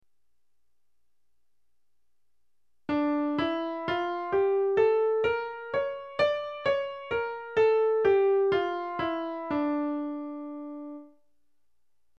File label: scale